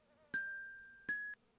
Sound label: Diving owl